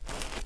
dirt jump, footsteps; chief

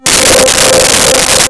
Halo Pad C5; Nintendo; July 2020 Gigaleak

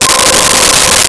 Solo Voice C5; Nintendo; July 2020 Gigaleak

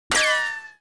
metal, metal hit

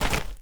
dirt land, footsteps; chief

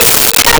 Marimba Bb4; Nintendo; July 2020 Gigaleak

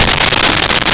Brush; BGM; Nintendo; July 2020 Gigaleak; DrMarioSound

